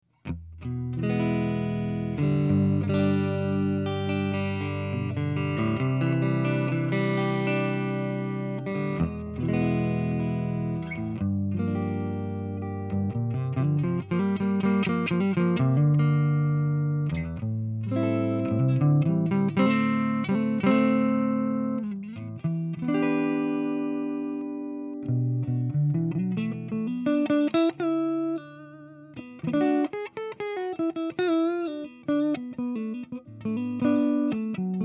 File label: strat normal, amps; Normal Channel; all controls at 12 o'clock; gypsy